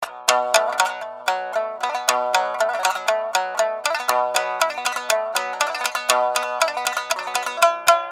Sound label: Shamisen